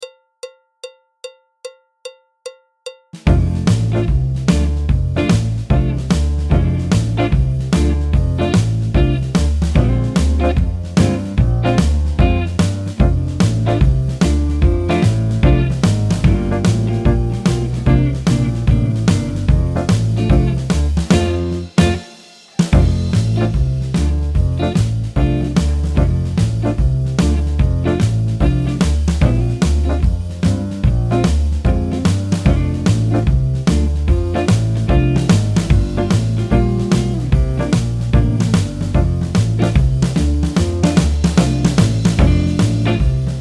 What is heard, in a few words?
alto, tenor, trumpet, trombone, piano; Jazz Syllabus Level 3